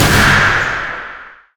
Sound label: reckless swing; olaf pentakill; LoL